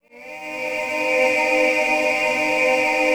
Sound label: MALE OOHS, Hip Hop; E-MU Formula 4000 Series Vol. 1; Hip Hop Nation